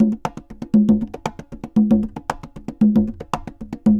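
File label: CONGAS SALSA, salsa drums and percussion; Best Service ProSamples vol.14; AKAI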